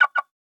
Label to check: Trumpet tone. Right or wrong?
Wrong